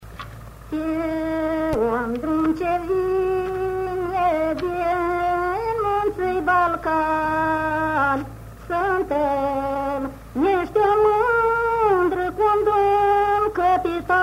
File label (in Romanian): Pe un drum ce vine din muntii Balcani; Fonoteca Arhivei de Folclor Vasile Adascalitei - CJCPCT Vaslui